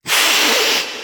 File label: Cat Hiss